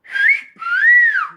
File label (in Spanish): silbido fiu fiu